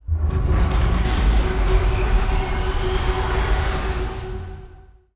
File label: event mega structure other empire build complete; Stellaris; utopia